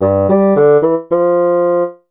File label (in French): basson; MOBICLIC